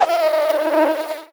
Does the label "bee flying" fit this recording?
Yes